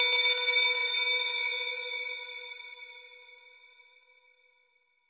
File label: new tones